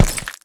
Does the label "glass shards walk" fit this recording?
Yes